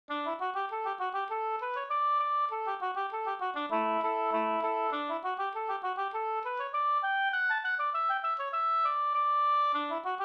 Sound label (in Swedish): engelska